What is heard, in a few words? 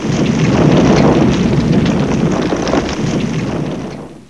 flames; Herrscher